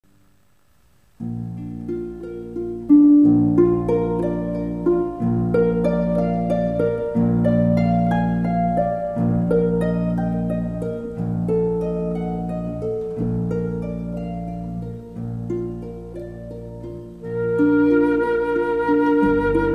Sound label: ave maria, harp